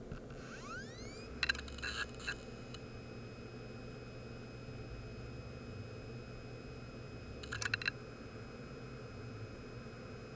hd sounds, laptop, bad; mac disasters

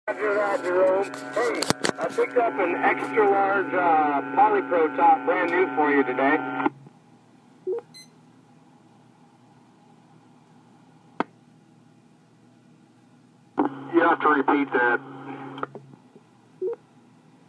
PS noise, radio problems; KZ0MBI

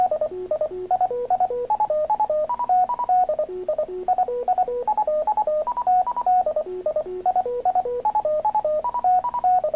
Fox; radio; foxhunting; Fox Box frequencies and behaviors

